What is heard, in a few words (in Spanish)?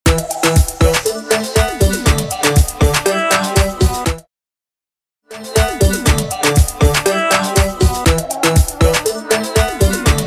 Intro Outro Clean 120 BPM Cubaton